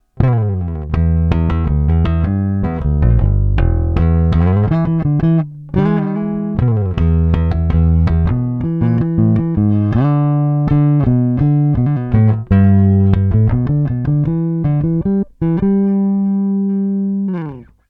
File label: Preci Clean Amp DI post EQ